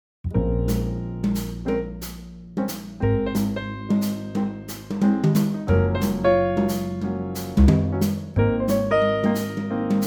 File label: Trio; key - Bbm - vocal range - Ab to Ab; swinging Trio arrangement